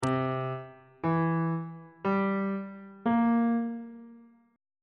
a sharp lydian 7th chord 2 is b sharp dominant 7th on bass clef